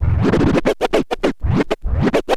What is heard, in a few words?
scratch short, Vinyl scratches